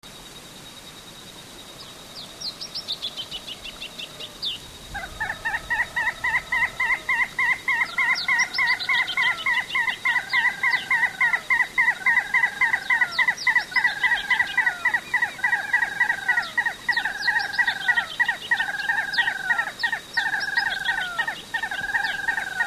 Porzana albicollis

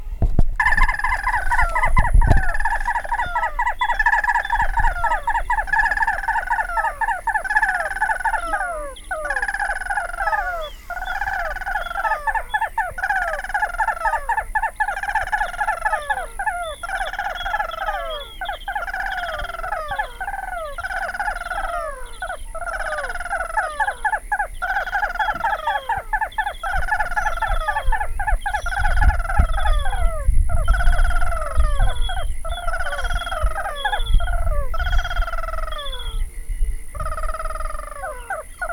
Porzana albicollis

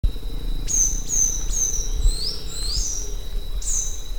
Pyrrhocoma ruficeps song

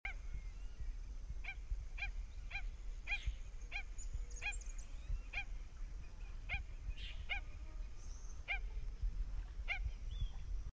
Himantopus melanurus

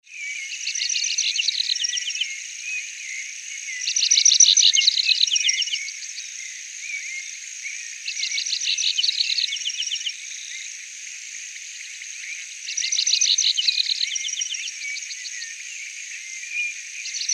Anthus nattereri, San Rafael

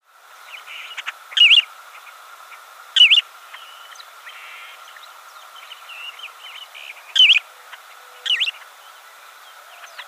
Pseudoleistes guirahuro calls; Di Tore

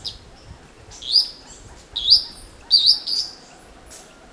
Tolmomyias sulphurescens call

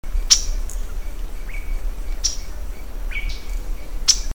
Trichothraupis melanops chit

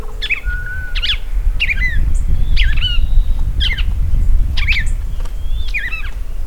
Paroaria coronata

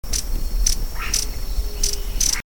Stephanoxis lalandi flight

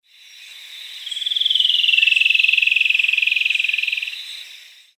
Philydor atricapillum, Tapyta

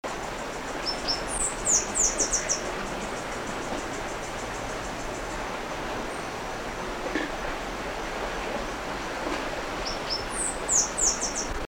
Arremon flavirostris

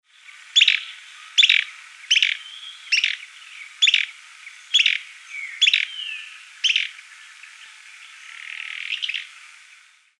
Euscarthmus melacoryphus; Song; recorded Kamba Aka, Departamento Alto Paraguay; Myriam Velázquez January 2003

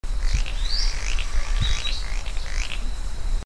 Tityra cayana

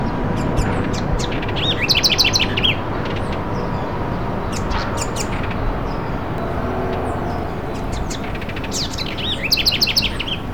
Troglodytes aedon song

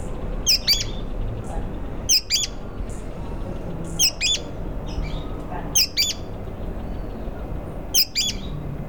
Synallaxis frontalis

